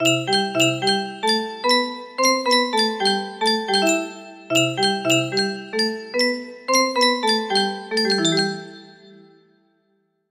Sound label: lullaby, music box melody; labyrinth